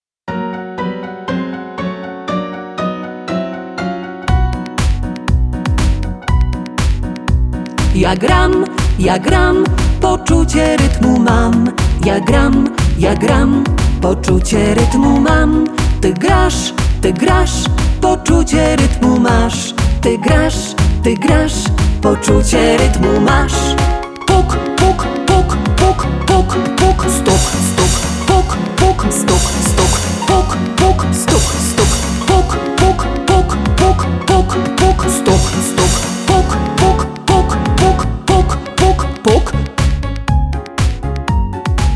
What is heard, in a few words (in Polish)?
STRAUSS POLKA drugi głos